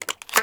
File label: shotgun reload